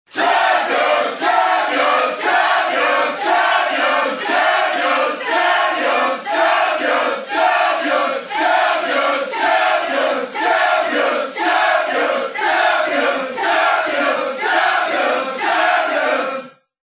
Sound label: Sports Champions, Windows Mobile ringtones; Microsoft